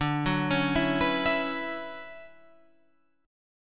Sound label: D-Major 9th Add 13th D; arpeggiated; JGuitar